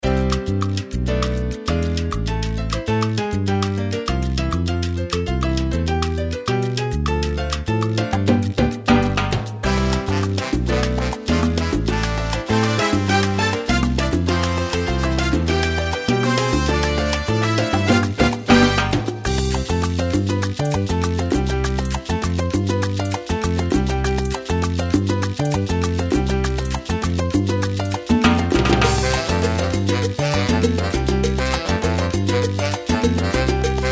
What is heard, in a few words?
Salsa